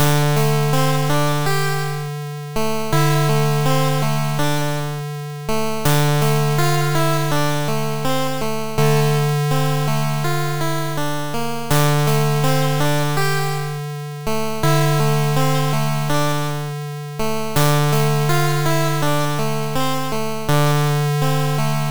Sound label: 8bit Stone Steps